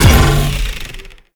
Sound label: Grendel Bowl Land; Warframe; Grendel Deluxe